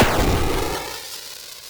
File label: medium, explosions